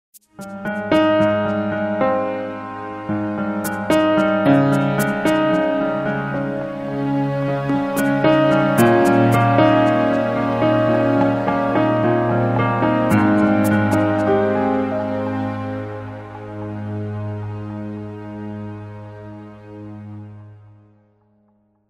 soft

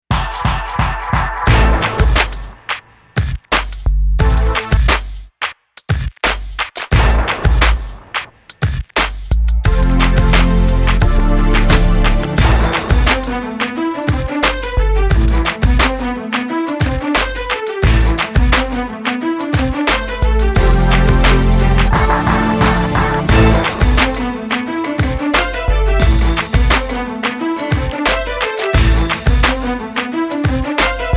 hip hop

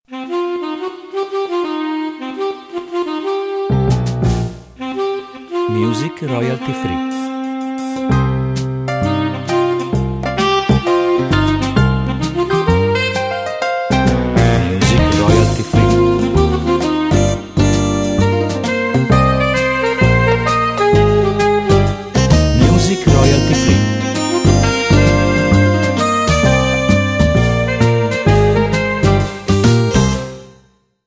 Jazz swing

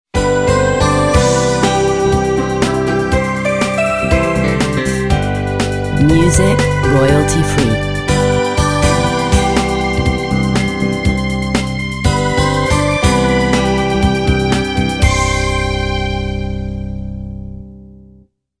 Pop

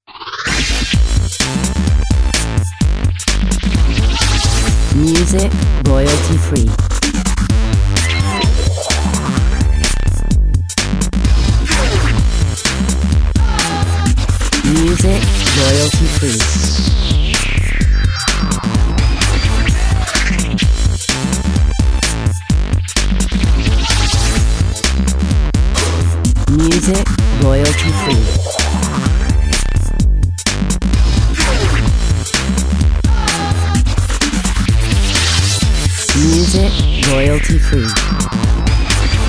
Electronic

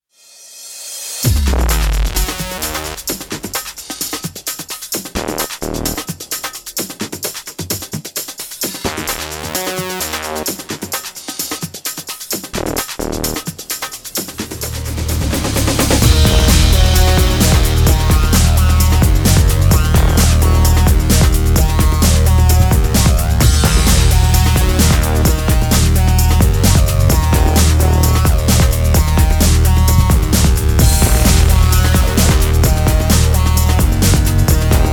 Electronic

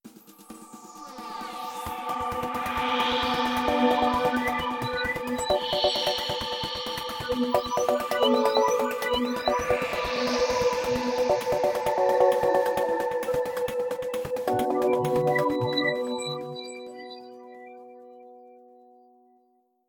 Ambient

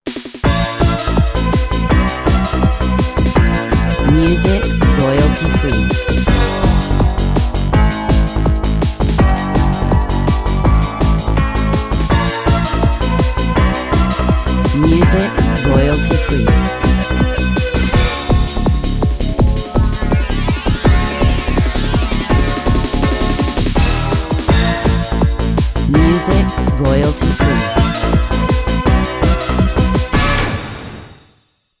Upbeat dance

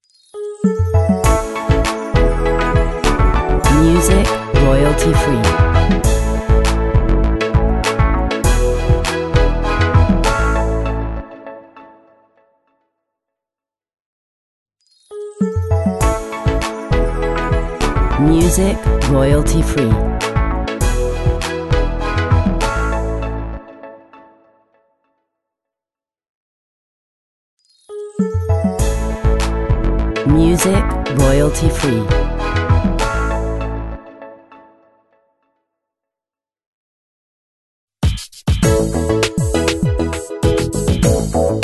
Radio logo

